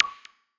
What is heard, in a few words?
Unlock, phone ui; ZTE; E850